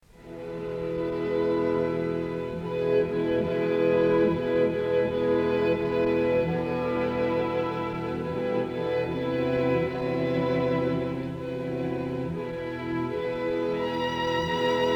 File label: string quartet